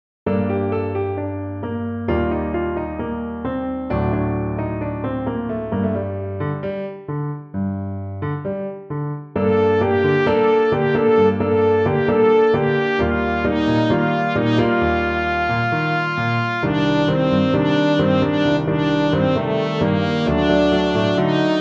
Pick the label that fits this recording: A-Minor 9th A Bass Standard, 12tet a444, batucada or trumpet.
trumpet